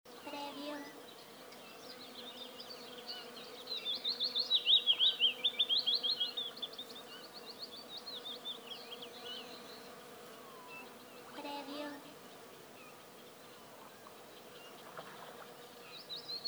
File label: Forest Lake Birds